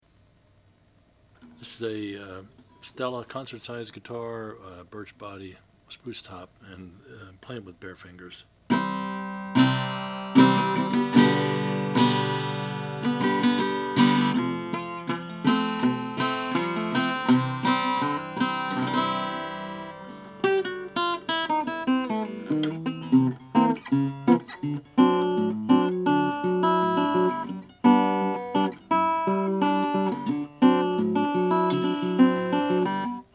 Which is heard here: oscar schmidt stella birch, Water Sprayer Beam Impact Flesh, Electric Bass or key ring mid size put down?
oscar schmidt stella birch